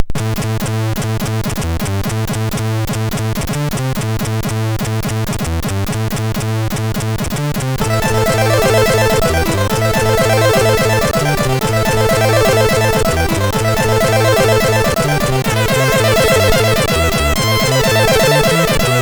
sid, MOS6581R3; Honom

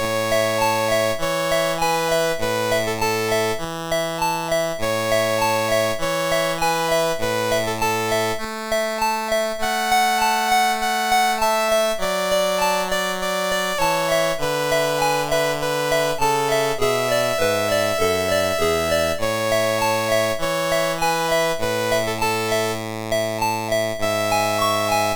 Panis Angelicus, MOS6581R2

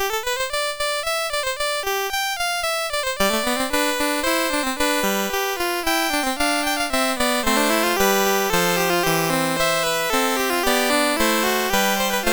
Canzon MOS6581R2, Baroque Master Works

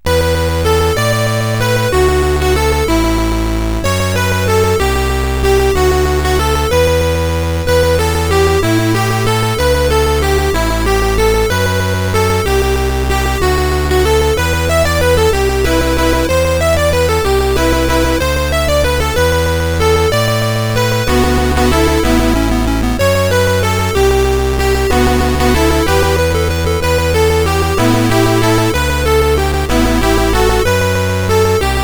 Music Box, CSG8580R5; Eight C64 Games in Six Minutes

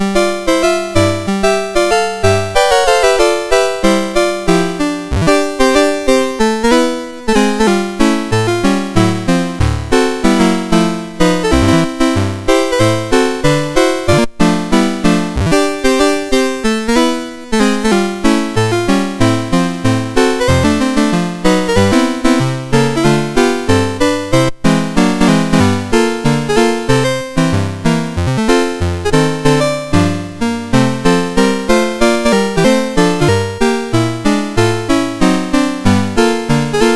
MOS6581R2

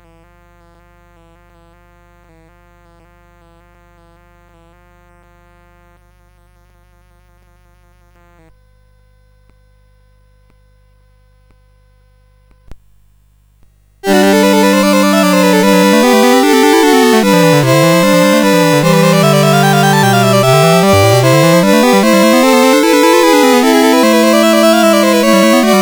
Preludium; CSG8580R5

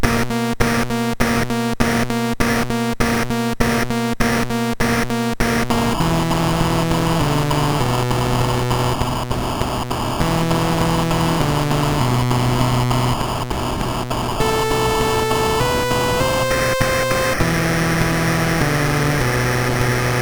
Drums and Stuff, MOS6581R4

